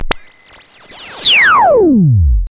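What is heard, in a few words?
SYN-FX, synth; E-Lab; X Static Goldmine 1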